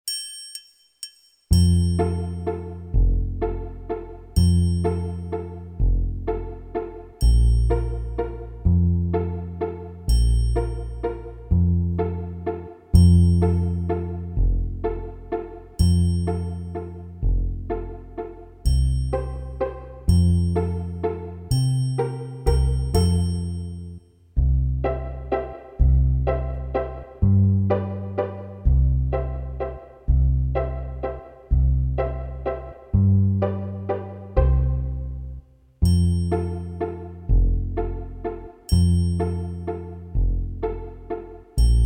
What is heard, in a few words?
Allegro In F Major; Beyer